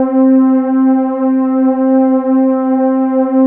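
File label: PWM string down; Analogon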